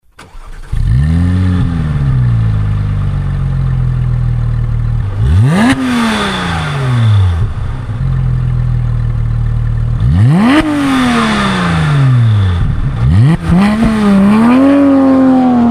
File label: 911 gt3 rs sound